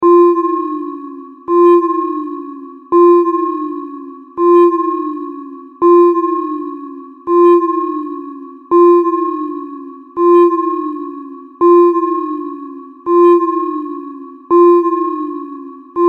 Telemetry Deep Space, Science Fiction; Scifi; 5.1

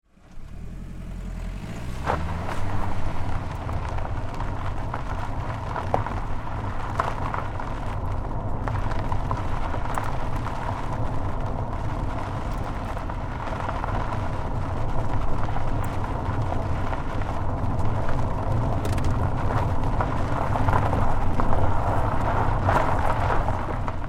Car, Tire, Onboard, Gravel-Dirt, Roll, Med; Vehicles; Cars

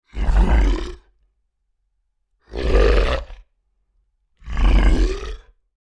Creature Large Grunts Aggressive; Monsters; Horror; BigRoomSound

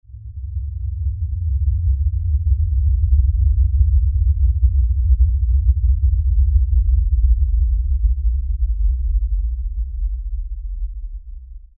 Lfe Rumble By Swell Low Bass Movement; Rumbles; Blastwave; Sound Effects:Sound Design

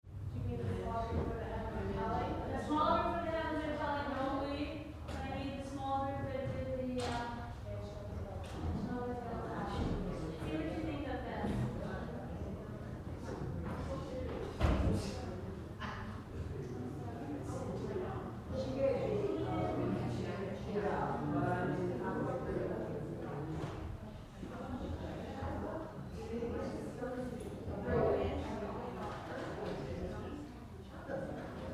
Crowd Church Choir Chat Moves Roomy; Crowds; Backgrounds; Big Room Sound

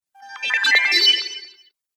Fantasy Mage Spell, magic; Magic Sound Effects

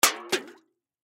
Aluminum Can Half Full Hit Flick; Tip Over; Drops; Objects

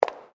FOOTSTEPS WOMENS HIGH HEELS CONCRETE STEP; Footstep Foley; Human

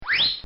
Cartoon Sound Effects Zip; Cartoon Sound Design

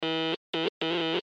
Morse Code K Letter; Blastwave; Technology

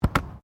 SOCCER BALL HANDLING; Soccer ball sounds; Sports

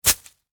Weather Snow Impact Ice Pick

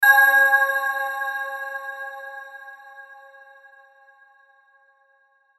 Hit Bells Choir Reverb; Production Element Imaging Element Accent Transition

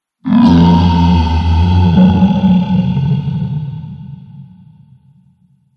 Zombie Putrid Fiend, Zomby Sound Effects; horror

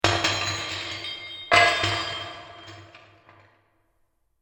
Dungeon Cell Impact; Miscellaneous Impact Sound Effects; fantasy sound effect; Andrew Fleming

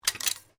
RIFLE REMMINGTON700 7.62MM MAGAZINE RELEASE; A selection of domesttic firearms; Rifles; Guns

